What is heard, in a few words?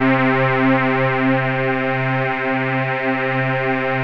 MOVE BASS; Masterbits; Vintage Voltage